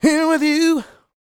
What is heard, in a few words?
SING, Vocal Planet R&B & Dance; ILIO